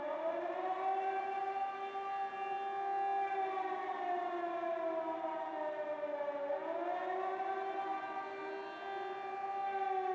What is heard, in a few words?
Air Raid Siren Loop